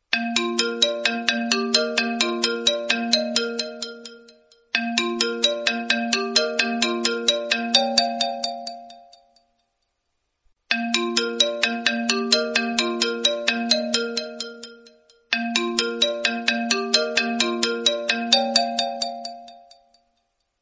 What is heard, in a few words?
classic tonee, ringtone